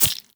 Swamp Jumper Hit By Arrow

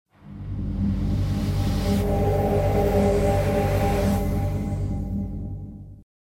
Transition; West Wing

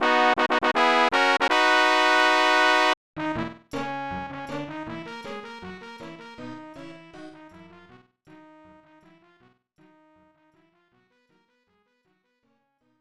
Casino Win; lufia 2; snes

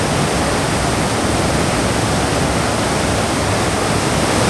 Waterfall Large Loop; Ruins; timez attack

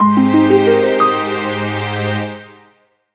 (Jingle) Fanfare

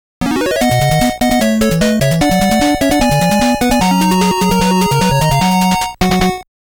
All Stage Clear

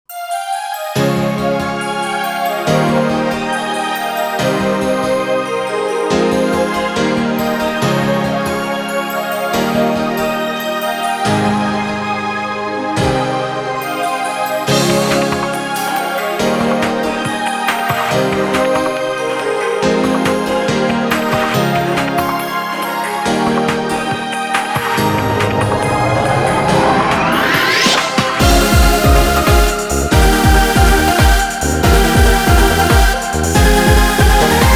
Ending Rain

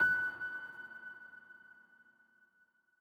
piano note; gamerip; Beacon Pines

